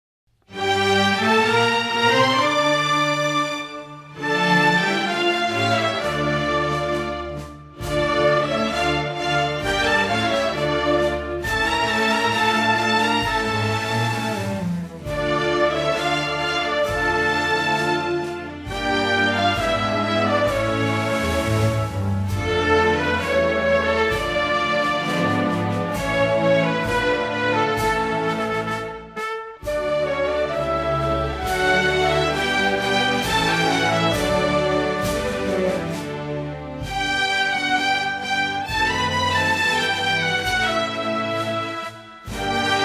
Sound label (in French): Hymne National du Maroc instrumental; version officielle